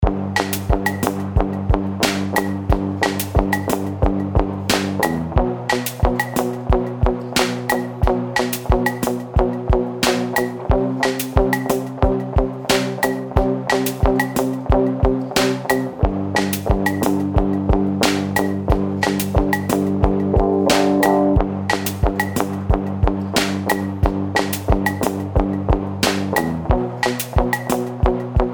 bomb planted

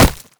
body impact; headcrab classic, movement; creatures

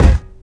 physics cube sm; Portal 2

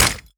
weapon flashbang impact; physics; weapons

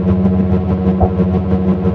body loop idle, dog; npc; scripted

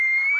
radio random, prison; Half Life Model Pack; Half Life 2; ambient levels

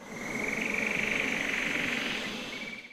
jungle bird; ambient animal; Counter Strike Model Pack; CS:GO

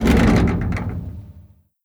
move, hinges drawers; seesaw; Half Life Model Pack; Half Life Alyx

